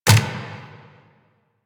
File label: Turret Dry Fire; Lasrian APC